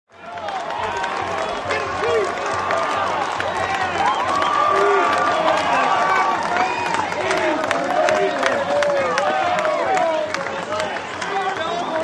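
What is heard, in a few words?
Sports Crowd Big Cheer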